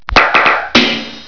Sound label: clap